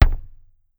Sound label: Kick, Clavia DDrum AT, Pitched Down